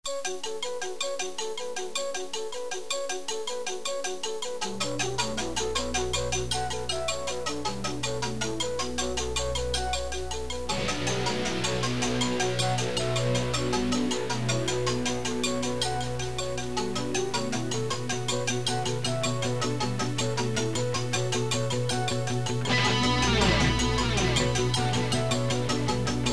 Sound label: rock